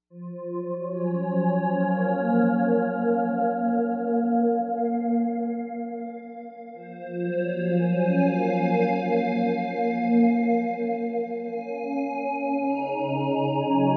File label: Wailin Squarez; Novation UltraNova; Factory Patches